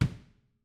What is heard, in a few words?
Kick Room